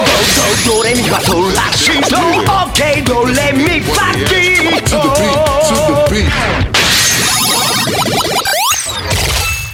Do Re Mi Fa Beat

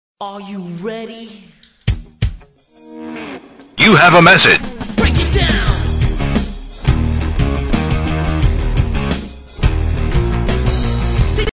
Message

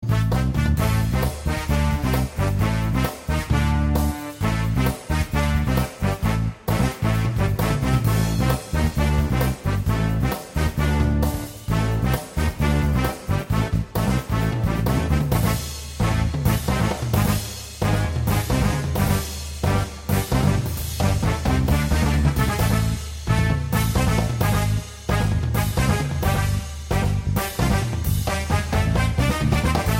Bebop; 707 Hacked

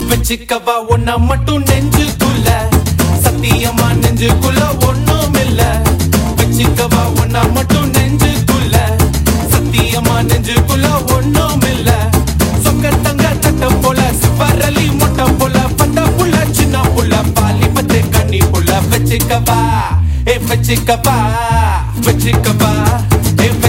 Tamil Remix

